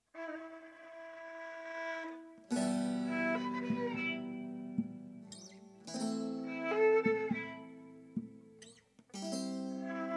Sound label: Sari Galin